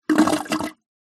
toilet gurgle after flush

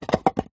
foley concrete block set down on top of another; zapsplat

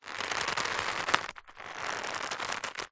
foley rope old thick movement twist stress creak; zapsplat

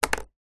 foley cigarette place down on surface

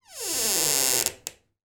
household door hinge creak

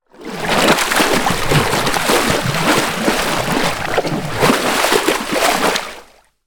animals shark splash surface water feeding

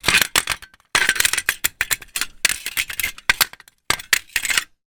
car jack movements on road surface

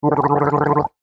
human male gargling water; zapsplat

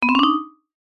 cartoon vibraphone short ascend; zapsplat

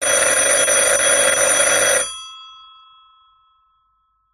siemens w48 wandapparat telephone rings once; 1934; Sound Ex Machina

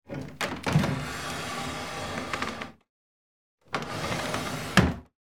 Wooden Drawers, Open, Close; lilesound

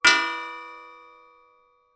industrial gas cannister medium hit ping

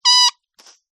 leisure toy rubber duck squeeze squeak

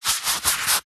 household toilet roll handling rub; zapsplat

